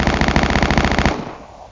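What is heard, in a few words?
machine gun; alienbreed3d2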